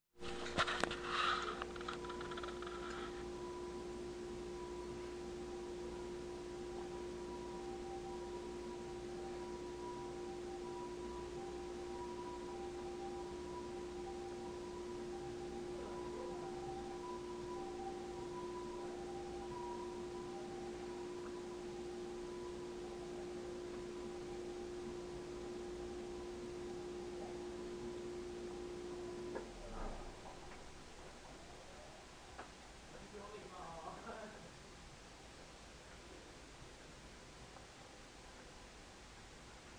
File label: siren